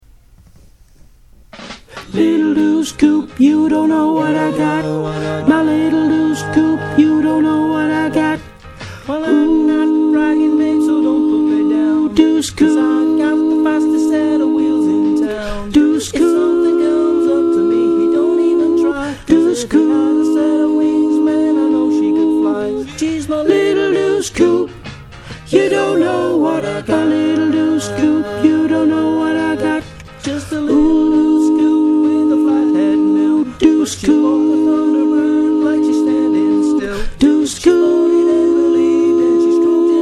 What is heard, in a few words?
Mezzo; Choir Harmonix; Harmonies